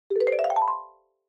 Xylophone Slow Long Up

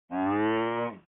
OS Sound Notification

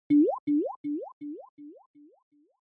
Anime Motion Low Delay

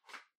Portable Cassette Far Open

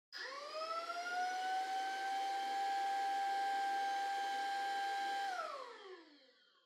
Vacuum Cleaner Far Vacuum Low Mid

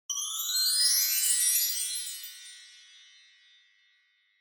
Wind Chimes, Up Wide

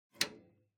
PC Hardware LAN Unplug

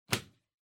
Power Strip Adaptor Floor Hard Unplug

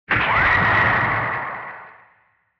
Retro Anime Accent Low

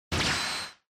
Motion Agility Mid; モーション・俊敏

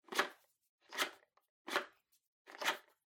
Megaphone Hardware Battery Remove

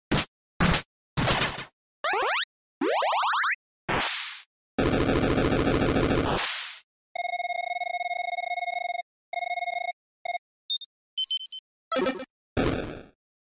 NES RPG

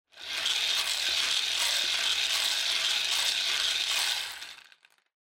Drawing Machine Garapon Spin Long

